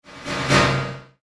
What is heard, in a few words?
Sharp Reverb Bounce Hit; Hit Sound Effects; impact; Andrew Fleming